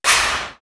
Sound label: AIR WRNCH; East West; Global Sounds; D4 FXS